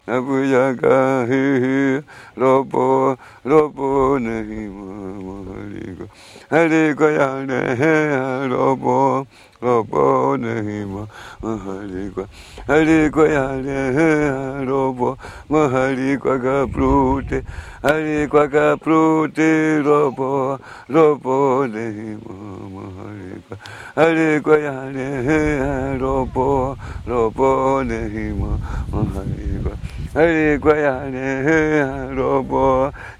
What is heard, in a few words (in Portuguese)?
timbiras